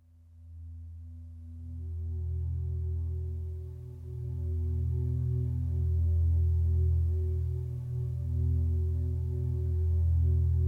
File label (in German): Meditation